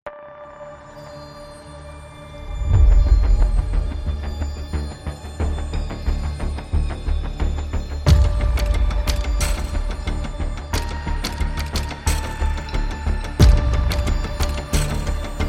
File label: Cyberpunk Trailer